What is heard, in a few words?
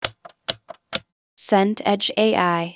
indicator click